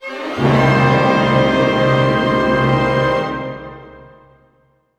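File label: glissando C minor, Orchestra; Sound On Sound - Peter Siedleczek's Orchestra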